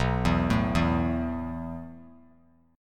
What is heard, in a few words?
B 7th Suspended 4th B down; B7sus4; arpeggiated; JGuitar